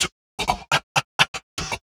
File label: 126 C VOCAL LOOP, Funky Underground; Loopmasters; Funky Underground Vol 2